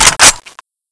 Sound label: an94 bolt pull, weapons